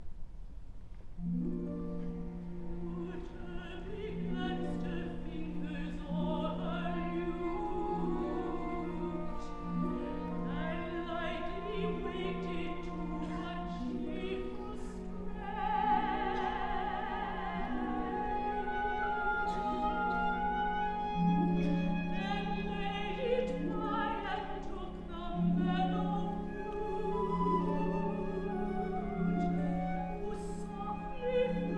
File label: Lute; Euterpe